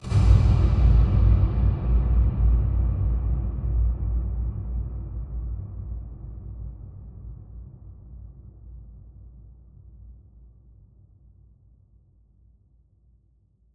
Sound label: Huge Verb Tail, Long Reverb Tails; impact samples